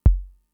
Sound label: kick, Fricke MFB-522; KICKS